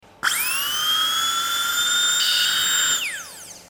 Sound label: dentist drill